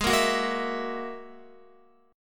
Ab 7th Suspended 2nd Sharp 5th down Guitar; Ab7sus2#5; strummed; 4F,4A,5C,5G,5C,6E; chordshape; Ab7sus2#5 chord diagram; JGuitar

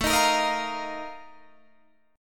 Bb Major 7th Suspended 4th, A, chord

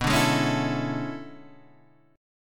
E 13th B

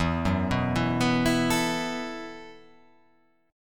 E Suspended 2nd Suspended 4th E, down; arpeggiated; JGuitar

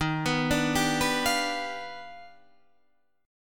Eb Augmented Major 9th; arpeggiated; 11,14,12,12,12,13

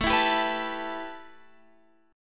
A 7th Suspended 2nd, A, down, Guitar, Open F; A7sus2; strummed

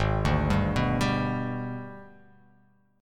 G sharp Minor Major 7th Flat 5th; arpeggiated; JGuitar

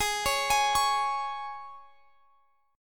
C sharp 5th G sharp

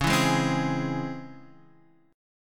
C sharp Minor Major 7th C sharp, chord; strummed; x,4,2,1,1,4

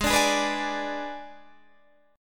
Ab Diminished Ab, Guitar, Open D

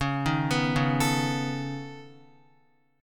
Db 7th Suspended 2nd Sharp 5th, Guitar; arpeggiated; x,4,1,4,1,4; JGuitar

